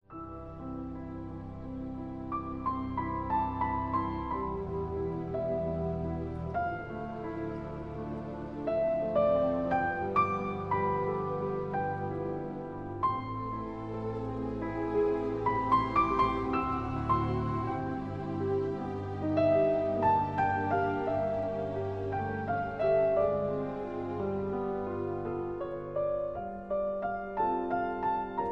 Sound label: Piano concerto in F; Shostakovich